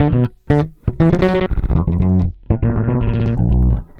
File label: Processed Electric Bass